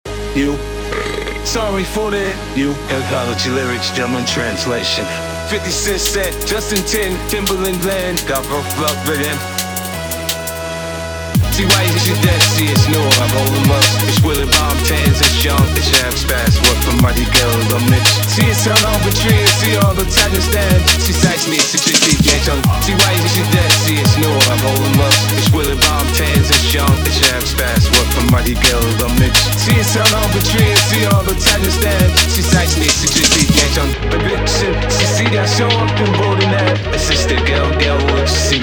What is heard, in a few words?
drumcircle